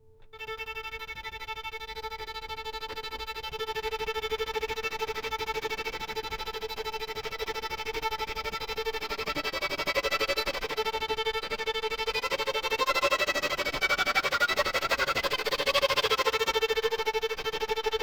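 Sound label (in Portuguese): violino agudo e rápido com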